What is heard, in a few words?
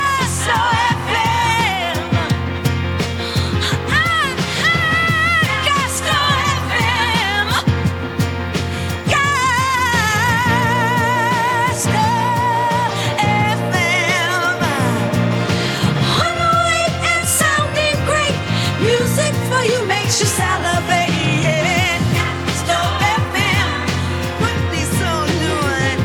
JINGLE Soul